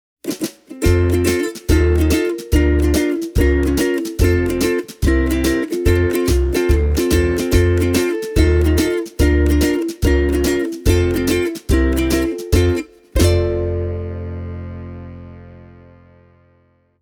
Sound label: ortega rumg